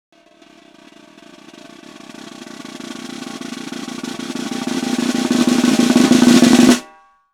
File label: snare roll